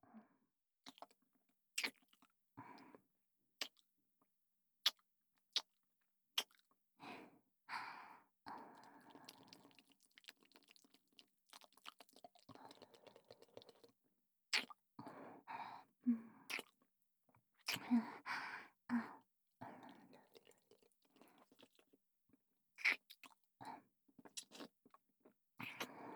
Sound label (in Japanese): フェラチオ; SEあり